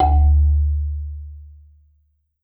Marimba D1; Sampleheads - Dave Samuels Marimba & Vibes

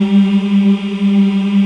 African Mm f; Roland LCDP11 Africa VOL-1

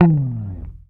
HOFNER, Bass

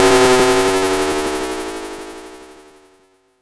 Cwejman S1 Spiny Plastic Rhythm

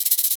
Cabasa Roll

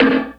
Hit, Fx; Sonic Foundry (Sony Creative Software); Esoterik Beatz; Bello

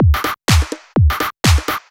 125 BPM, Drum Loops; Zero-G; Club Techno; Drum Set

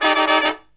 Killer Brass Riffs

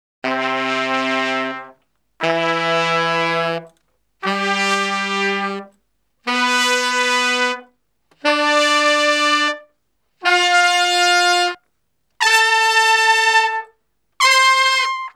Salsa Picante; Masterbits; World Zone VOL-3

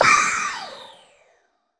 crash L; Zero-G; Chemical Beats; CRASH ZOO

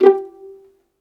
VIOLIN, Synclavier Strings; ILIO

